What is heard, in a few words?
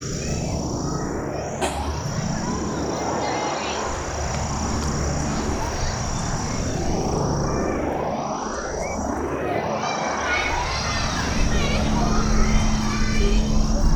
Children